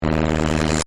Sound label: Hit Zap Buzz; BlastWave